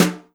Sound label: brushes, virtual drum sounds